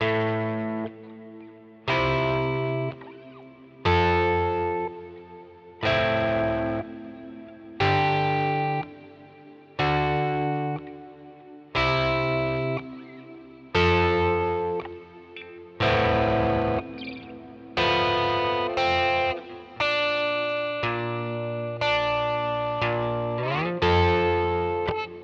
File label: Reverb; Simplifier DLX; Ether